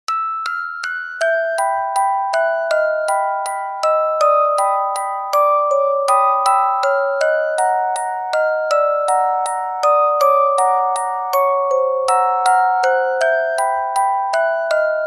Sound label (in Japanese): itazura orgel